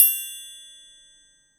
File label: Percussion; Vengeance Samples